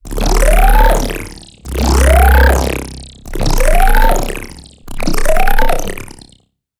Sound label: Growl; Chaos Monster; Routed Sound; Vital Expansion - Chaos Bringer Vol.1